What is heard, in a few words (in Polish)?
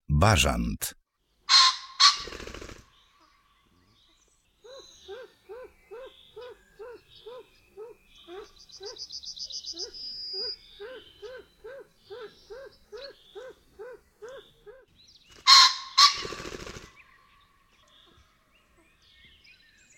bażant